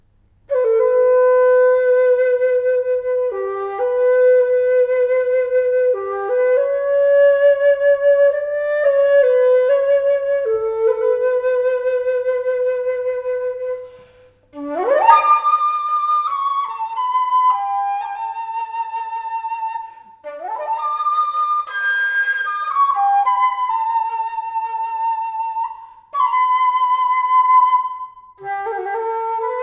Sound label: Flute